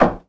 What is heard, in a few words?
wood, player steps; quake2